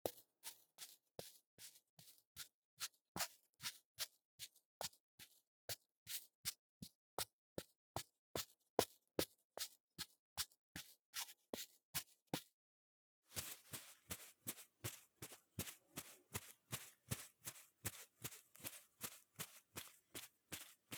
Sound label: Rug Doll Footsteps